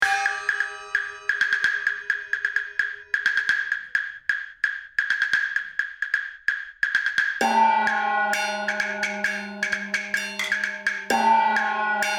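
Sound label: background music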